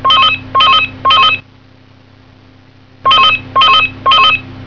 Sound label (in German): TELEFON